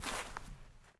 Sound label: step bush